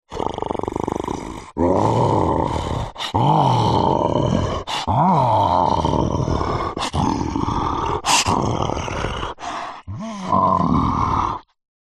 horror yeti monster growl snarl; zapsplat

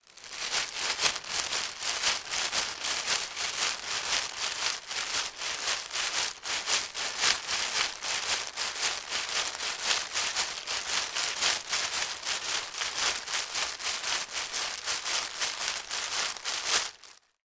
plastic bag walking movements; zapsplat

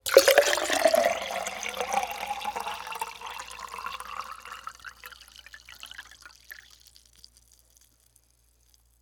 food drink champagne pour into glass; martinimeniscus

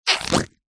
cartoon squeeze pull squeak rubbery squelch

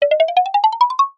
cartoon footsteps climbing ladder; zapsplat

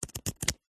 household book workbook pages flick through

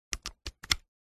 technology micro usb cable plug in; zapsplat

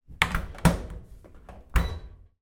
household dryer door close then open with beep; zapsplat

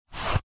shoe ballet foot slide out; zapsplat; foley

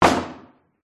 pub wooden chair set down on wooden table; field reports

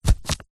sponge brush wet paint single dab on paper; zapsplat

